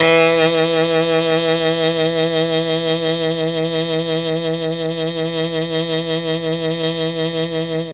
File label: E2 MKII SAX, mellotron